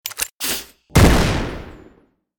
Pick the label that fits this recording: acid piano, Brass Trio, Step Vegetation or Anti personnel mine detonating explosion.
Anti personnel mine detonating explosion